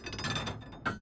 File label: reload